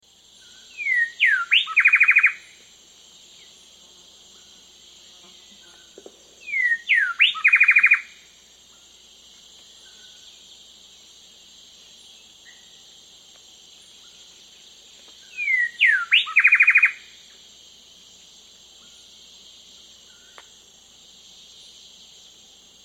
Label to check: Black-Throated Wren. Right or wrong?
Right